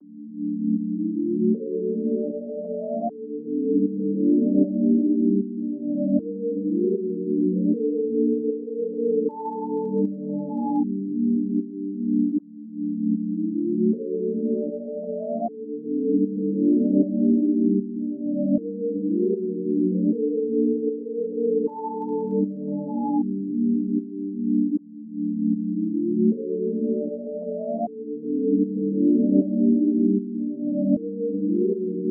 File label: 155 BPM G Min Pad; Cymatics; Utopia; Houston